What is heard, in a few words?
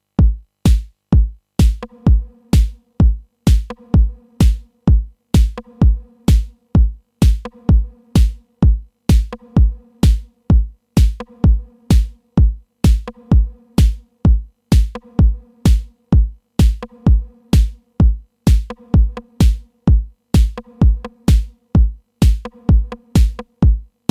TR8 MX1 4 Rimshot On The Floor